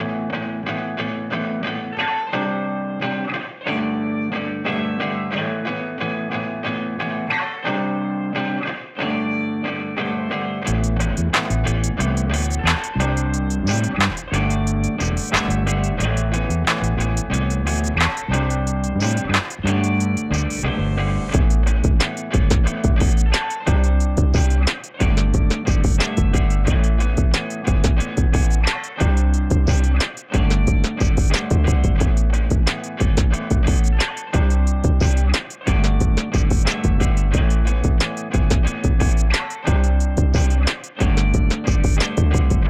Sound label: Fog Loop